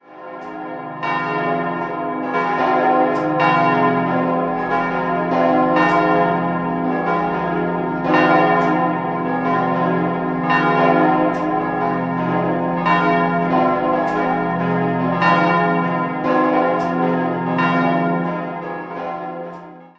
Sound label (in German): Wellheim, glocken